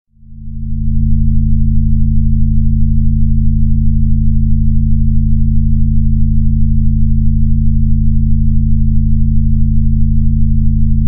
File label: Brainwave Enhancer Beta; Focus